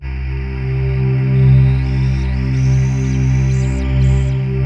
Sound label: WS DA C 3, Korg Wavestation; Back In Time Records; Korg Universe VOL-1